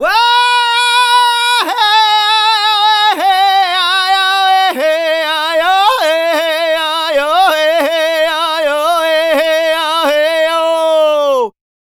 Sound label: Native Vocals, Native American; Continental Drift Aftershocks